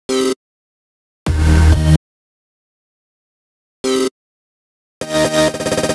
128BPM Cuts; Verve; Vengeance Samples; Verve Kit

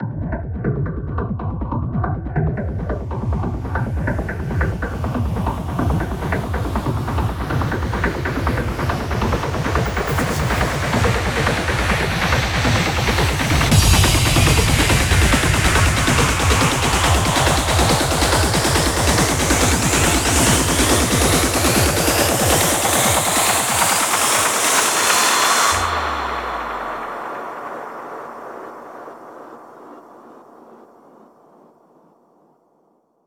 140BPM 16Bars, Hands Up Trance Fills; Quantum; Vengeance Samples

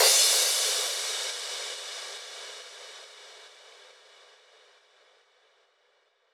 Manhattan Kit 138BPM Crash; Drums & Perc; G#minor; Vengeance Samples

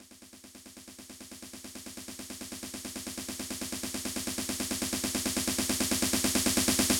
137BPM Snare fill; Drums & Perc; Amajor

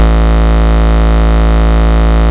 Bass Root A

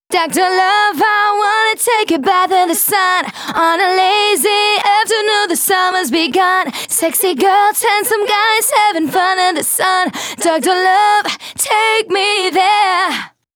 Female Vocals DRY, 140 BPM; Vengeance Samples; Vocal-Hook Kits; Song Kit

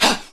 Vocal Oneshots; Vengeance Samples; Vocals

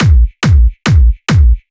Kick, 140 BPM; Vengeance Samples; Vocal-Hook Kits; Song Kit; Play Girl

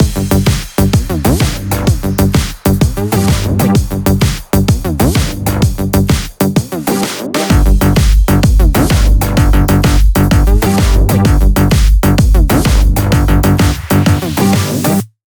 128BPM Mix; Vengeance Samples; VFH1 128BPM Tron Trio Kit

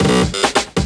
Fill 140 BPM

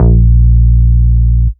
Root A#, Ultimate Bass; Vengeance Samples

